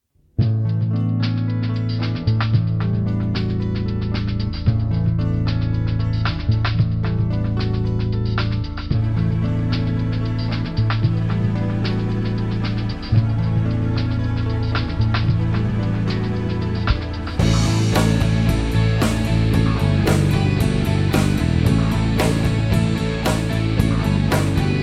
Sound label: Fairchild 670 Song Stereo